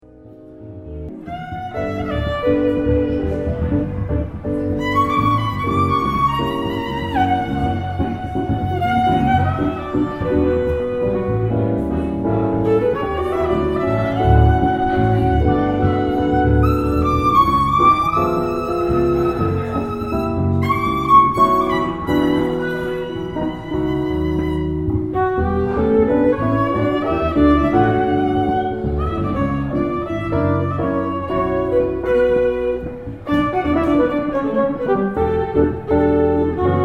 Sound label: Spain, Violin